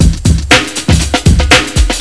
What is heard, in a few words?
drumloop; awe32